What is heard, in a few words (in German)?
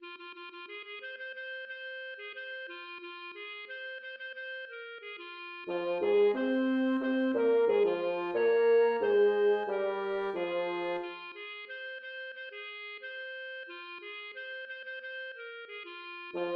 Hold on Bass